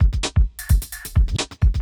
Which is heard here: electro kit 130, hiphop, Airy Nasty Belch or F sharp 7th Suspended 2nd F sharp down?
electro kit 130